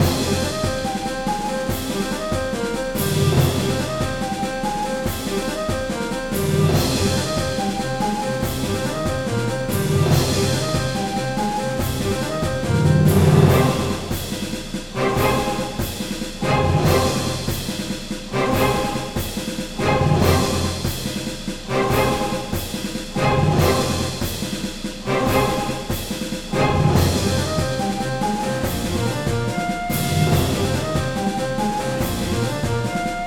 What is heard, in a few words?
Combat Battle Loop; Stratos